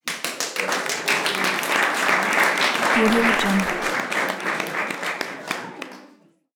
indoor crowd applause; debsound

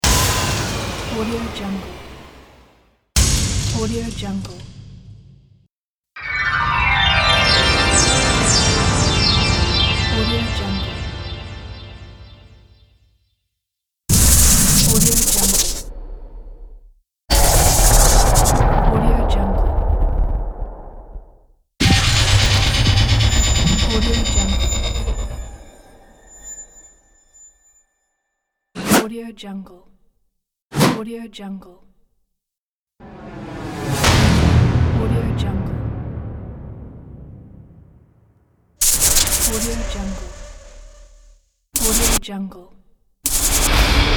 game sounds effect pack

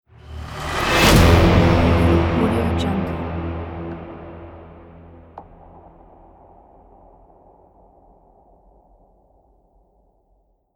dark cinematic logo button

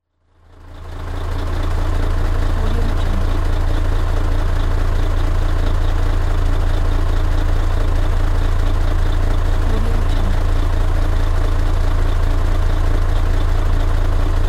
engine sound

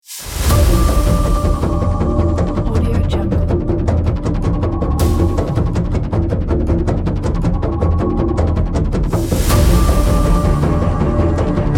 trailer epic war intro

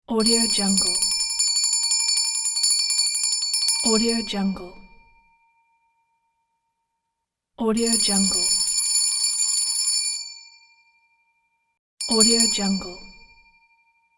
bells

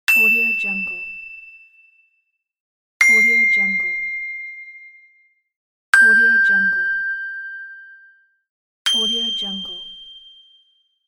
ding; moonlight sounds

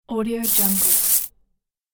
golden bonus; catsoundstudio

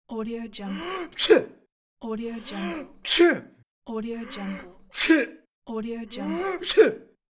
sneeze

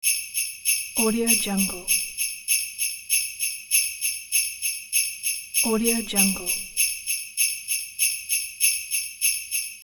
christmas bells loop

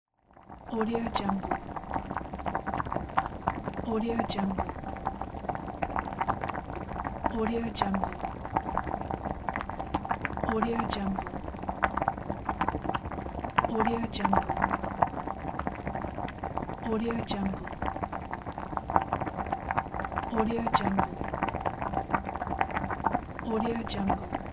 water boiling

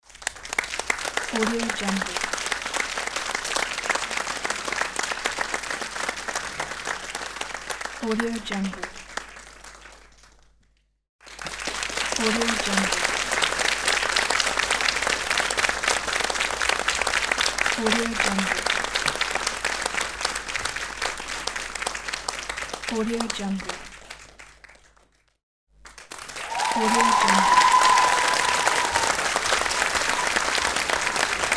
audience applause

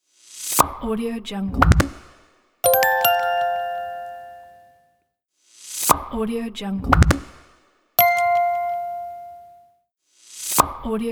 infographic logo

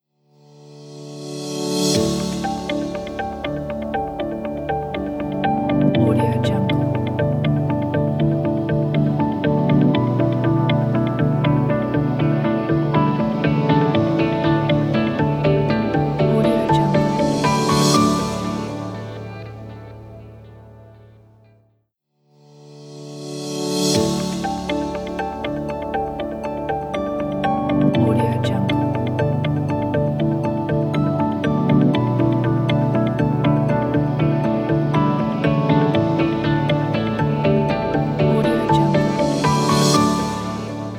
corporate logo

